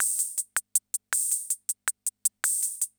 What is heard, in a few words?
CR78; Best Service; XX Large Most Wanted